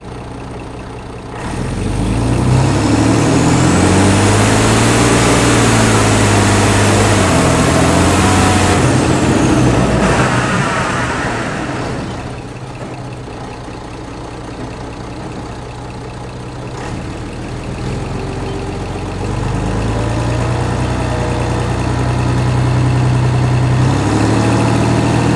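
ikv 91 onboard fast drive stop reverse stop engine mix; Sonniss GDC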